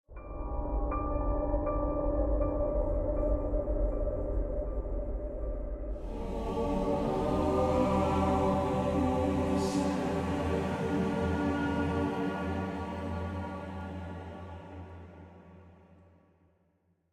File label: String; Assassin's Creed Unity Dead Kings